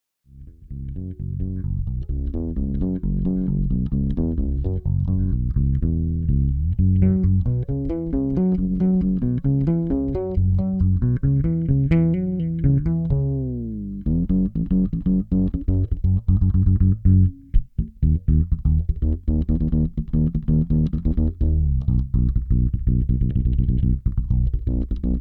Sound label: Fretless Synth Bass